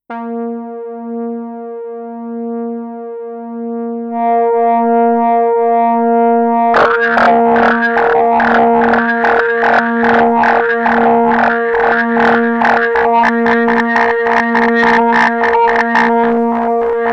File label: FM Synth Modulator